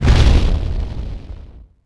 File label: fireball